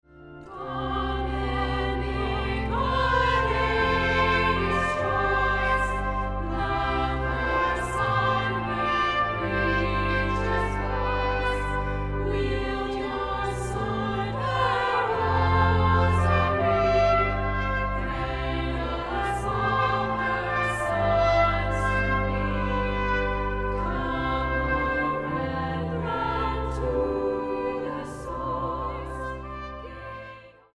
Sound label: Our Father